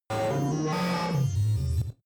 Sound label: space computing machinery hard busy strange